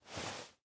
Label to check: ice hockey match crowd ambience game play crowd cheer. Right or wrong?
Wrong